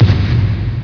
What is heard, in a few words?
foot; mk2; Kintaro; goro